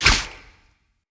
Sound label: lantern rope cut; Objects; Ode Lantern Crate